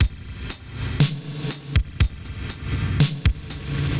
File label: Spring Verb Compressed TR 505 120BPM; musicradar; cyborg beats